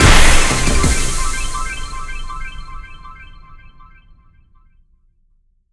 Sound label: big Win End; netent; doublestacks